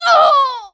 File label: Damage Female; gamerip; Car Simulator 2; android